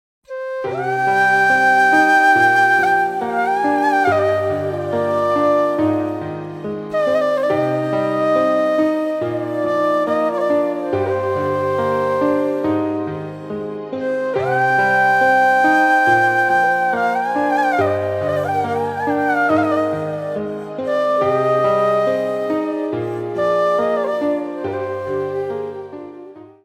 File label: Flute